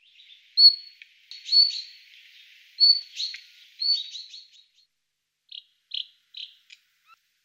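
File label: Nightingale call; Solovei pozivka2; ornitologiya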